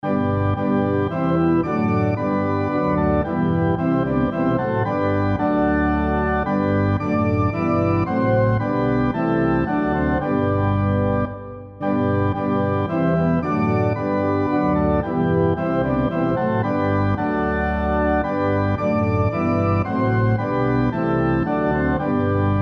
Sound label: SATB on Piano Example